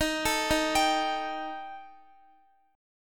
D sharp Major Flat 5th D sharp Guitar, chord; D#Mb5; arpeggiated; x,x,15,14,15,15; 4F,4A,5C,5G,5C,6E; chord shape

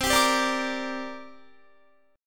C 7th Suspended 2nd C down Guitar; C7sus2 strummed

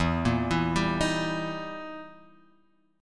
E Minor Major 7th Flat 5th, E, down

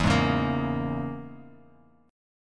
E 6th Flat 5th Bass Standard, E6b5 chord; strummed; JGuitar

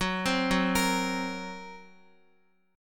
Gb Major Flat 5th, Guitar; arpeggiated; JGuitar

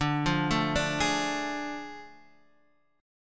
A Major 7th Suspended 4th Sharp 5th

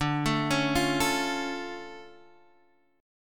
D Minor Major 7th D Guitar Open G

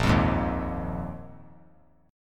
Bb 7th Suspended 4th Bb 3C,3F,3A,3D; strummed; JGuitar

